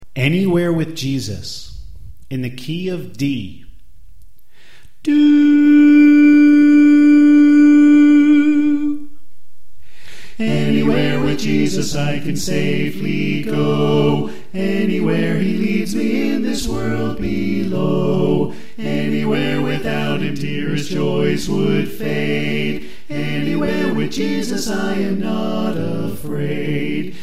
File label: Soprano Left